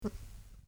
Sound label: Mhh